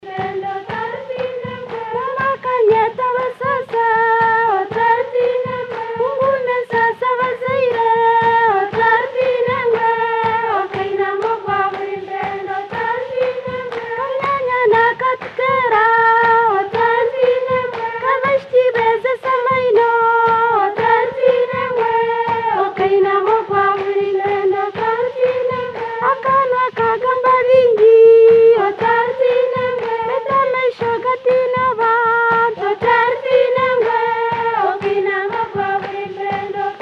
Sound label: Rugaaniire, uganda